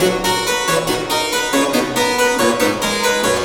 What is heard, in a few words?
Synth Melody; Eclectic Electronica